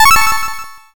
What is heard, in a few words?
win